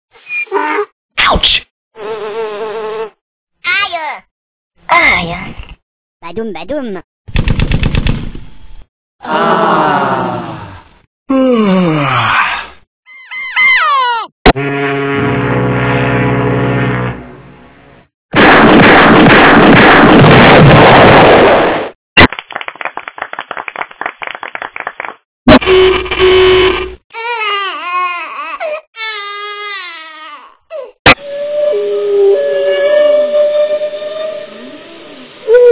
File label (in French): bruitage